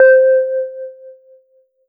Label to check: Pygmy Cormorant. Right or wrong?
Wrong